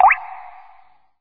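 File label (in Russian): звон капли из мультика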